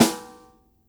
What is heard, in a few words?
SD, dry kit, Percussion